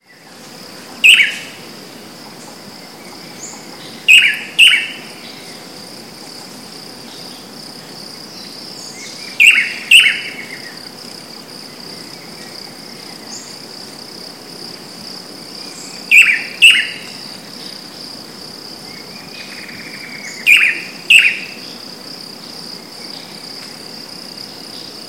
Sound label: Chiroxiphia caudata; PE Cantareira; SP; minisony